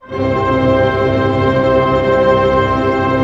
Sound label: TREM C PP, ORCH TREM; Sound On Sound - Peter Siedleczek's Orchestra